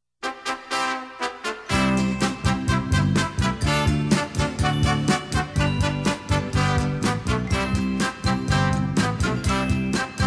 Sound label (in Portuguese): Formação de tríades e tétrades Musica Infantil